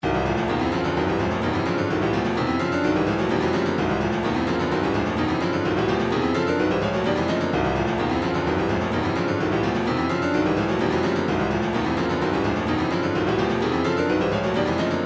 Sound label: retro funk, midi; got to be